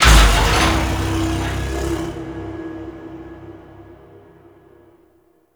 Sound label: dark machinery air burst; Warframe; Tau